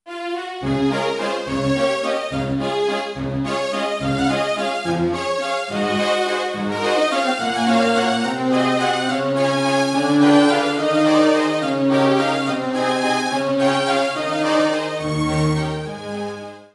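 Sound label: town waltz; warutsu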